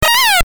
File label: meow